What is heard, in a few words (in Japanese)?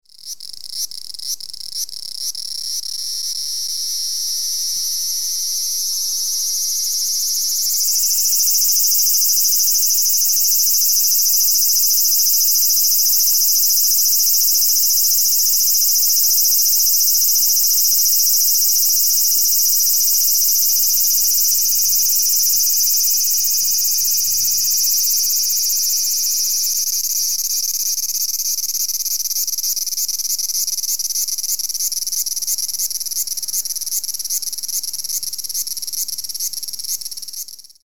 aburazemi, insects